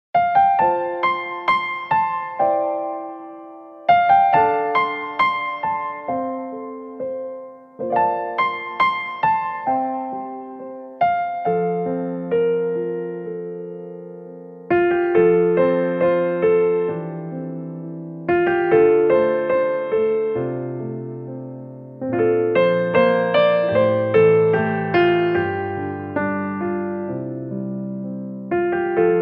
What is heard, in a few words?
yasashi piano; shichou sakuhin1